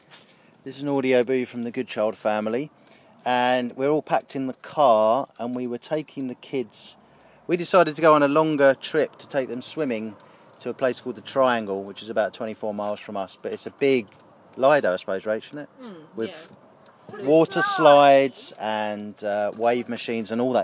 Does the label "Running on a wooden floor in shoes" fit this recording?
No